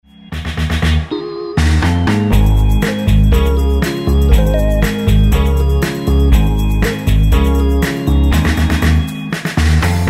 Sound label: cha-cha